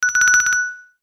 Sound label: multimedia game sound bell ring ping